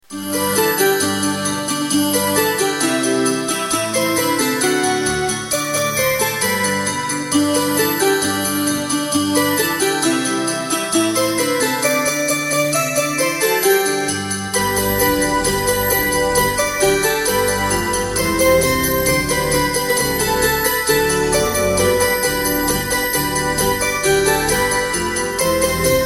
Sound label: jingle bells; newyear